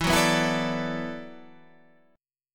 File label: E 7th Suspended 2nd Suspended 4th, Guitar, Standard; strummed; chord; JGuitar